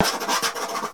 pencil write; SpongeBob Diner Dash

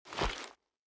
weapon Set Change Clean; gamerip; Out of Reach: Treasure Royale

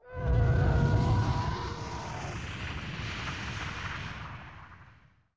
TIE flyby

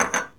dropoff drinks; SpongeBob Diner Dash

